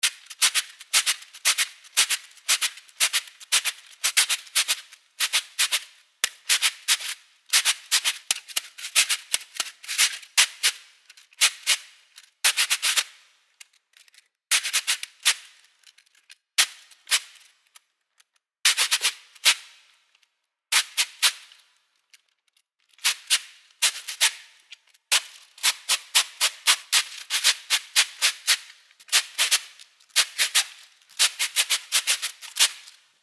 afox rhythms

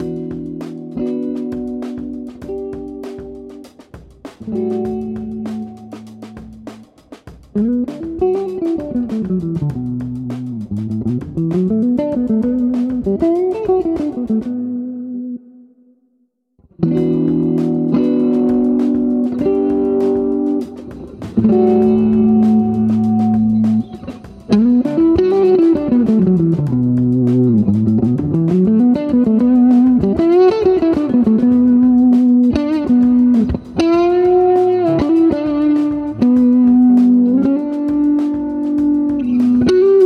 jazz guitar

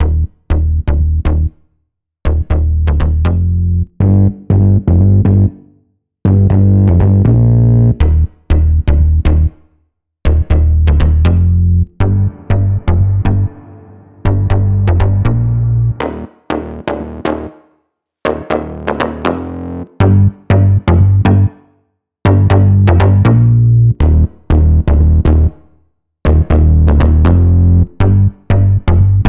lolcomp on synth bass

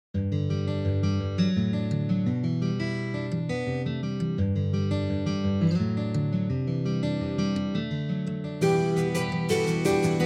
nylon

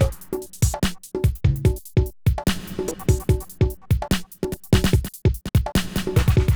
dub3

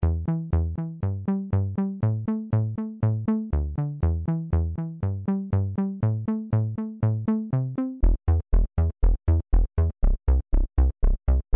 basses

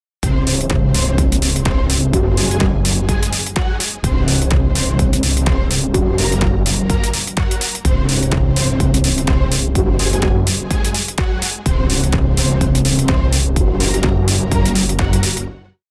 5th dimension diva

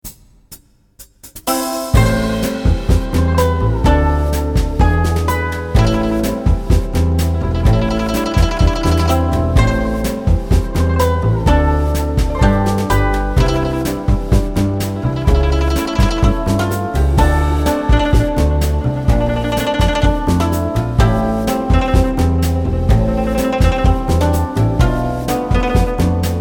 guzheng

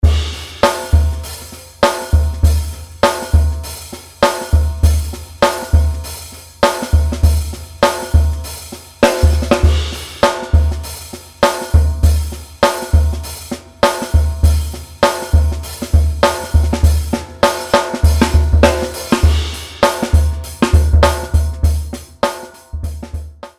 funk midi groove

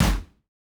explode stomp; nova sound

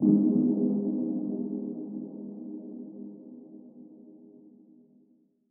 telephone ring ambient

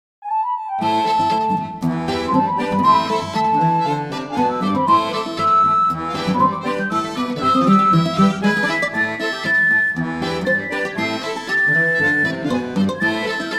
tango

